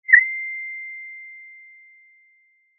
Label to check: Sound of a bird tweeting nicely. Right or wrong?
Right